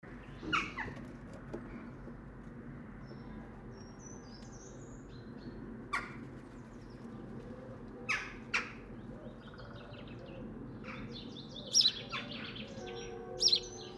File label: Farm Churchbells Sparrows and Jackdaws; Heiden; ORTF